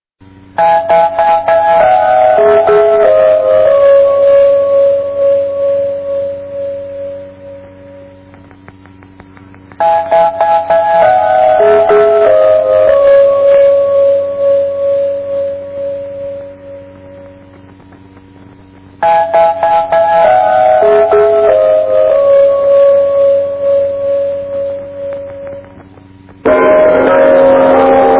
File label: russian, interval signal; vor c1992